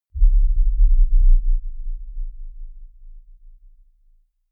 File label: deep sub rumble